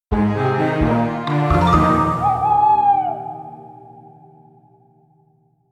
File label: Audio Logo; SoundWise